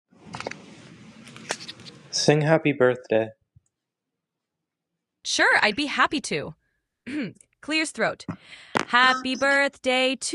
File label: Birthday song